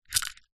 leisure toy game water ring toss handheld button press